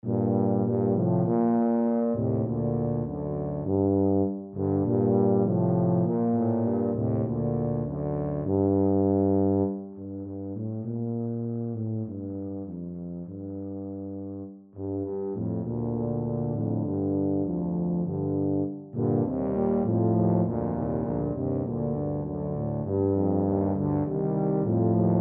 crown roses, tuba trio

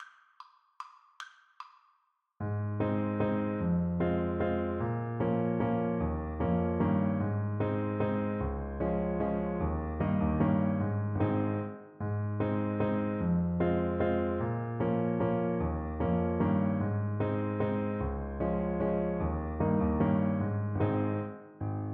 French Horn

